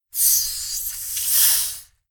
food drink soda bottle open fizz close up; zapsplat

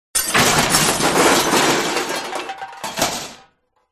crashes metal glass; Audio Mangler

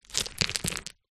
foley pebbles many movement; zapsplat

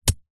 warfare knife throw dig into grass hard

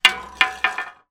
industrial shovel fall over concrete; zapsplat

